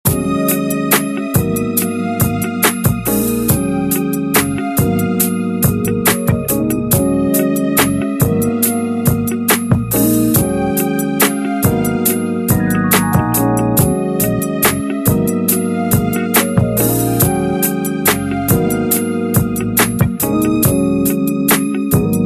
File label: iphone ringtone